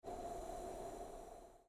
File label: hang gliding; sustainability